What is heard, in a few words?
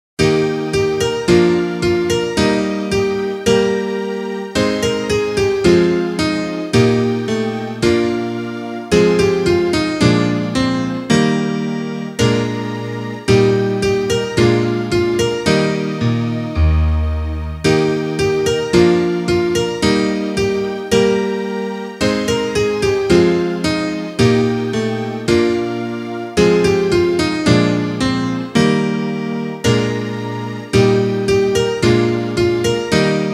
instrumental